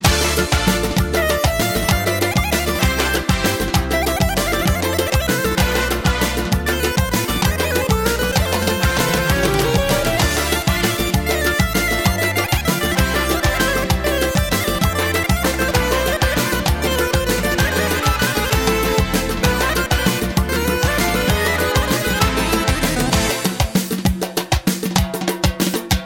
Kavkaz Armenian

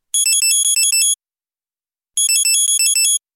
sms sound

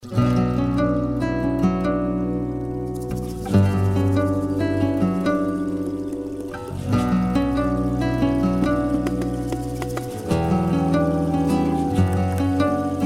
Iphone Awaking Tone

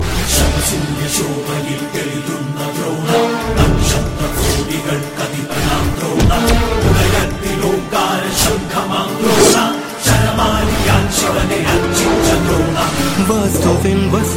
Theme Music; Drona

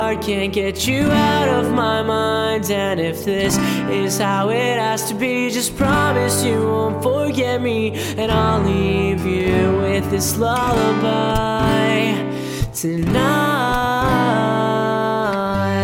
Lullaby

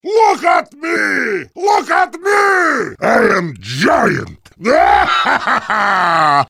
Heavy Ringtone

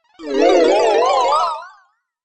Wierd Sounds

